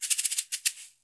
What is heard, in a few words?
GNZ ROL A, Percussion; Sampleheads; NYC Percussionworks; GJ PERC VOL2